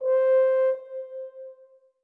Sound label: orbit hornsolo; Sky Children of the Light gamerip